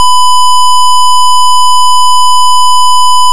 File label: square